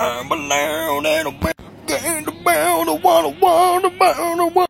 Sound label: drunk redneck singing; tiktok